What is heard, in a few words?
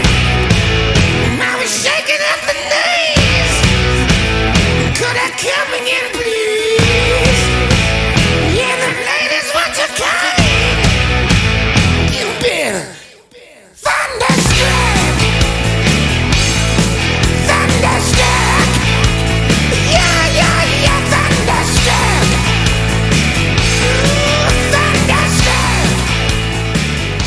second chorus